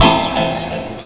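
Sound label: PIANO chord, Ragga & Dub Reggae; USB Soundscan vol.27; AKAI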